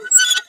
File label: Gadget Rotate; bad piggies; android; mobile; windows